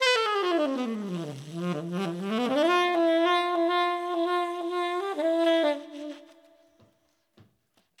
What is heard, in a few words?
Fast And Loose 12beat Dmaj 90